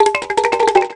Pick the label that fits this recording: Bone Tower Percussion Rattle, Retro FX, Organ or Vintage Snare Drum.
Bone Tower Percussion Rattle